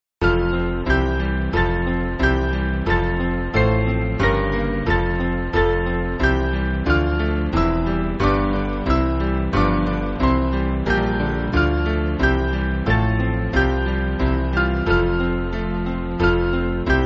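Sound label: Band, Music Backing; At The Cross; Stabat Mater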